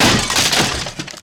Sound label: hit crates; Nickelodeon - Destruction Truck Derby